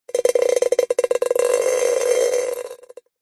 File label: nature water drips sudden short metal container small